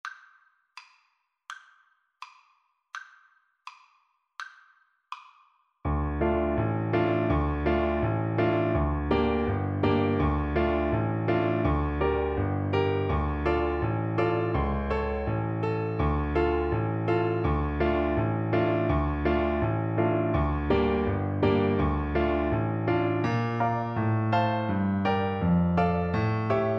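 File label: xylophone, Percussion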